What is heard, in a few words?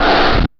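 Flange Snare reverse, Roland SH-32 Drumset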